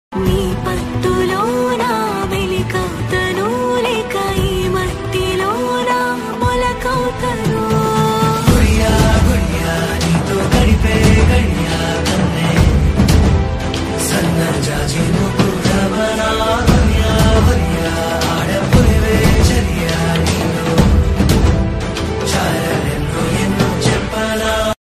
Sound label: bgm ringtone; bharateeyudu2